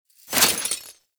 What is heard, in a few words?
Mech Release, Rifle; Thanotech; Trumna Prime